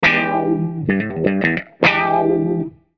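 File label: Musical Element, Drum Snare March; Blastwave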